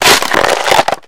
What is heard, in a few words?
web door hit; video games; ambient; source engine; portal2; outland